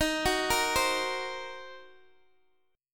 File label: D sharp Minor 6th D sharp down Guitar DADGAD